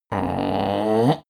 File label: male grumble strain start; menschen; koerpergeraeusche; soundpool